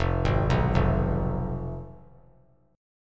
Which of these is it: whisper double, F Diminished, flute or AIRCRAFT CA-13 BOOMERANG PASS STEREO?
F Diminished